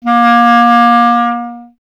clarinet A#; Miroslav Vitous; Solo Instruments VOL-1

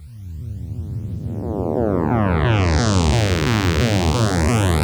Monotron Sci-Fi

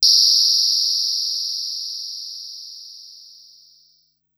Noise High, Korg Wavestation-SR, Drum Kit; Wave 455 - Drum Kit

